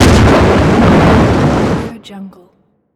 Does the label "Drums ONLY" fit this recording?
No